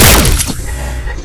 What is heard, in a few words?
shoot, weapons; shotimatum